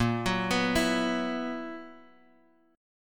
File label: B Major 7th Suspended 4th A sharp